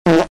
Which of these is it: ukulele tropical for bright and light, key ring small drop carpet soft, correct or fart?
fart